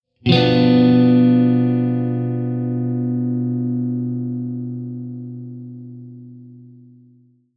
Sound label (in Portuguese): Acorde de Triade de Bmb5